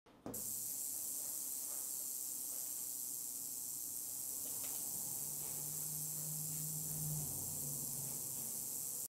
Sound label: rattlesnake; مار زنگی